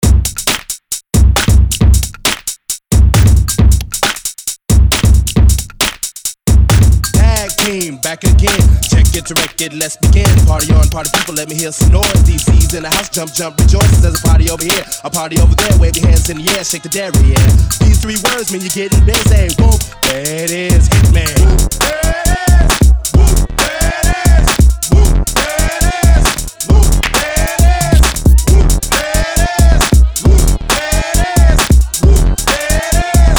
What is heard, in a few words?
mashups